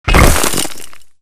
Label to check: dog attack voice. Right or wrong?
Wrong